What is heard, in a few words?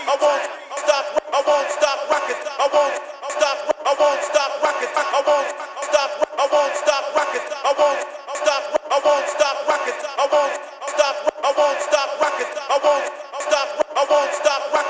Acapella; Lost In Nightmares